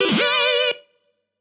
Bolt, notifications; Google Pixel 4; Retro Riffs

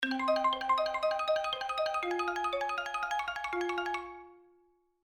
Xylophone

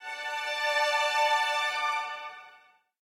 spring strings, notifications; Google; Google Sounds

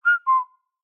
Bird Cicada Notification Day; Xiaomi Redmi-Note-8-Pro

